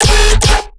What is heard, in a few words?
bass hit D, Bass Stabs; Virtual Riot